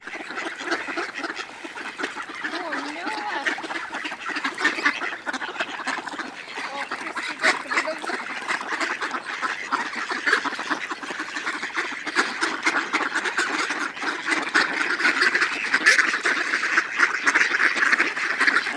feeding ducks at park; kilkenny castle

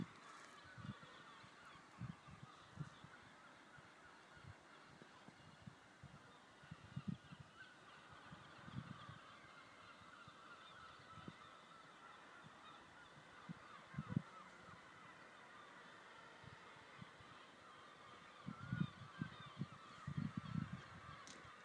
guillemot colony at South Stack

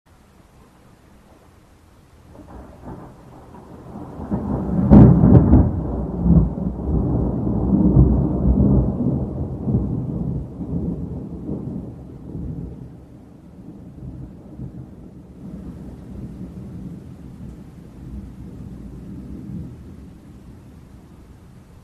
thunderstorm early hours dramatic at the start

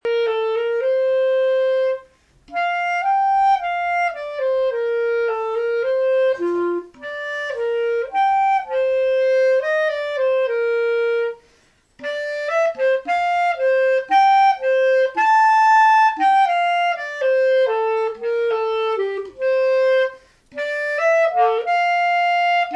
clarinet

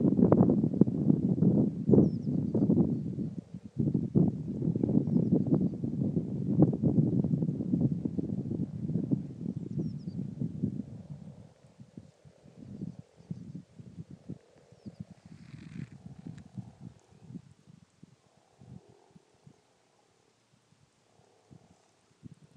is this what frogs sound like in spring

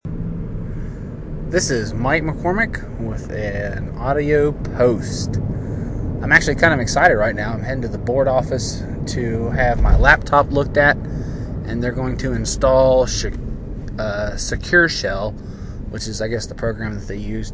excited

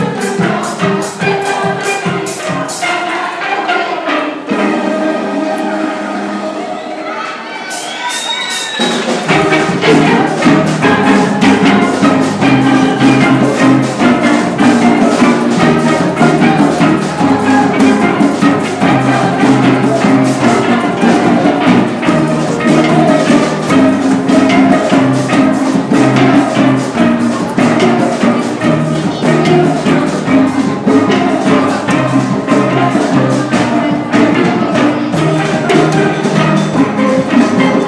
world cup theme song on percussion instruments

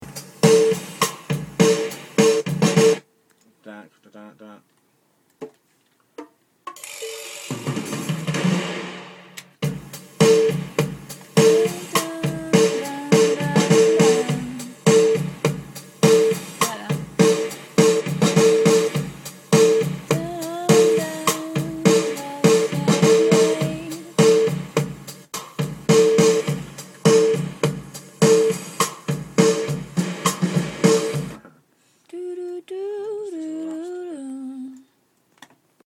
drum programming

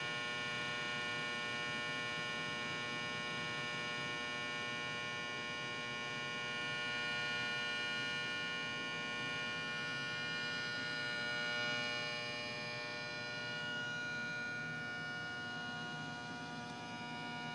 exit sign noise

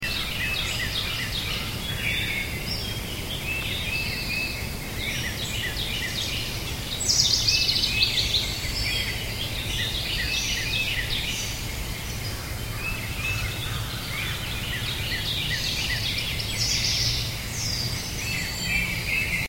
dawn chorus

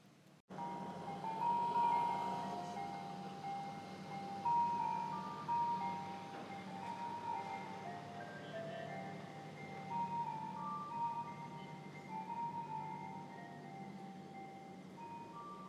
creepy ice cream van tune

